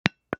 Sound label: JAR GLASS